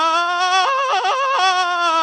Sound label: scream; tony stark